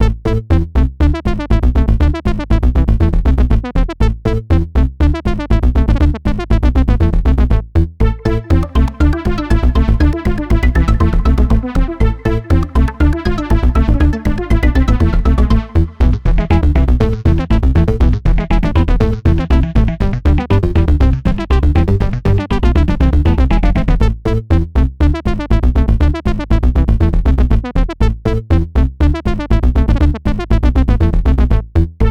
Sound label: Judge Music; Earwax; Jackbox Games; The Jackbox Party Pack 2